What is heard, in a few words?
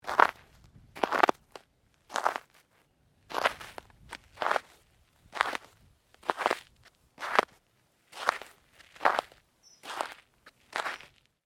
Footsteps: sneakers walking on ice, hard and crunchy; Stuart Duffield